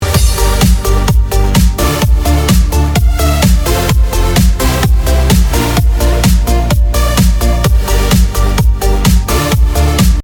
trance

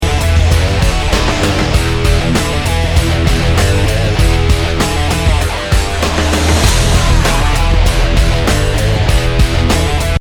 hardrock

